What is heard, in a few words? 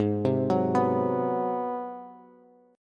Ab 7th Sharp 9th Bass, chord; Ab7#9 arpeggiated; JGuitar